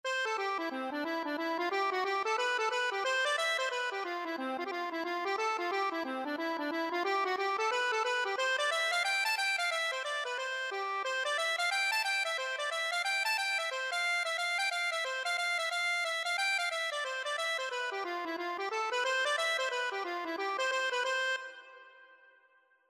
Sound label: irish music, accordion